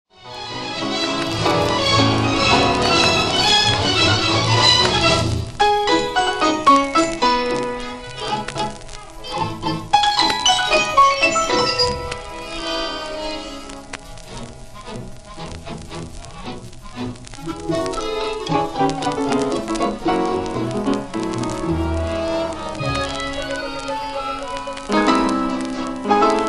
suite for orchestra, piano & Deagan percussion instruments; Grainger; In a Nutshell